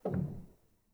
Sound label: rel, Grand; bitKlavier; Binaural